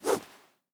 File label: 2handed cleaver attack; Rust